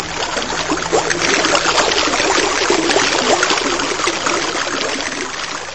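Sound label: stream noisy ringing close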